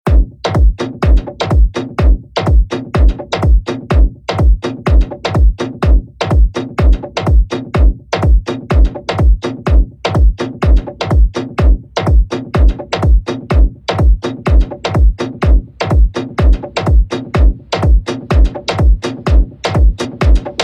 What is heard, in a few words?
Steam Train, Extended Mix